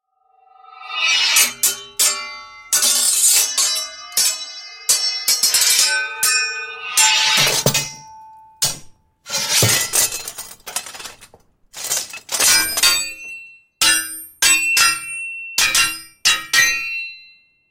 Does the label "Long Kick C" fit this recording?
No